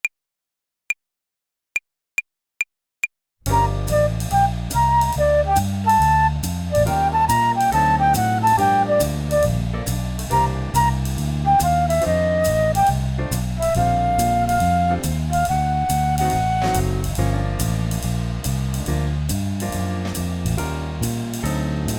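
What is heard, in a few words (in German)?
Swing Phrasierung Übung; play along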